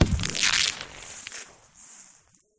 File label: Glitch Factory Boom Crackle; Glitch Factory 01; sound spark